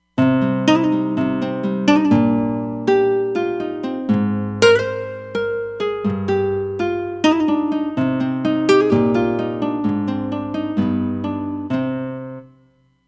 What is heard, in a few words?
Nylon Gtr VS, Roland Fantom XR